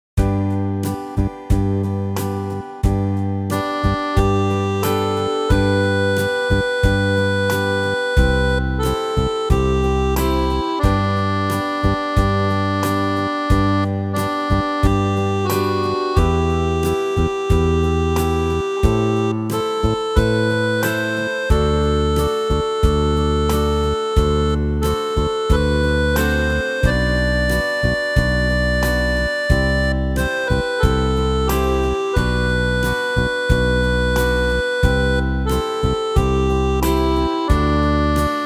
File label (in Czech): doprovod g dur